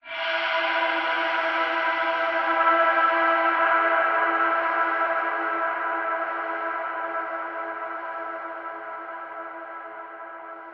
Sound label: suspense; DayZ-Epoch; dayz sfx